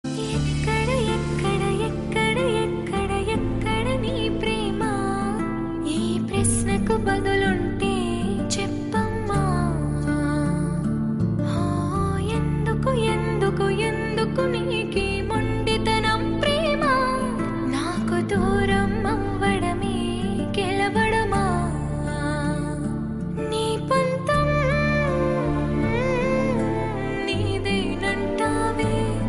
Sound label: Female Song, Telugu; Nenu Local